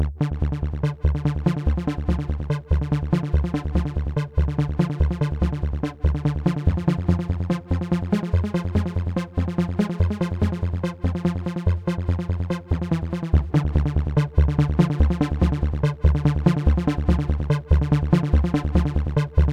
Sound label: synthmaster sequenz delay unisono